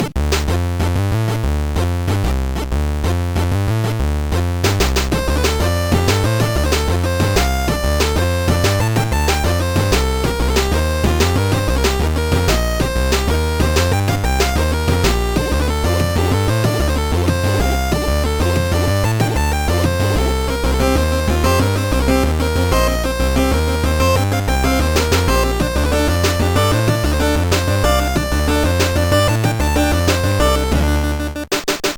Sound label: funky funk; Trackers; Modland